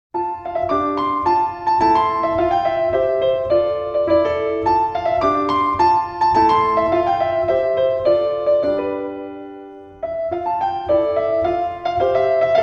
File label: piano solo; 2. sats fra Syv slaatter og impresjoner for piano solo